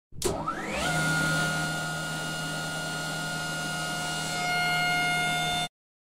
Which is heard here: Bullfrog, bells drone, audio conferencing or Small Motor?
Small Motor